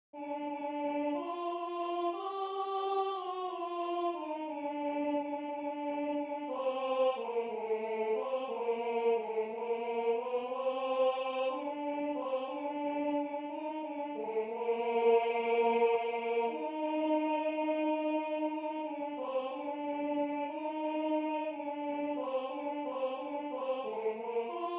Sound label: Tenor; Venez divin Messie